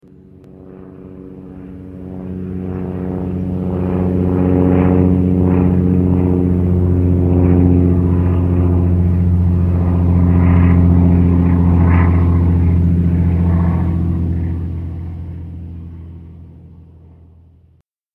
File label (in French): Avion Son Court